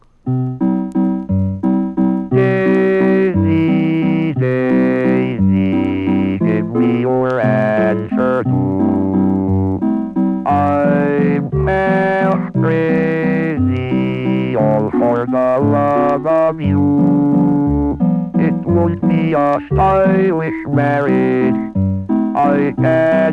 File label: bell labs singing machine; scitech